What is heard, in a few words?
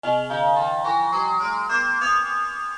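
church bells; Multimedia Cube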